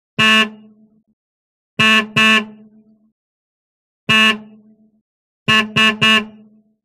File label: Horn 1929 Chevrolet